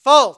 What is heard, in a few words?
serve beep close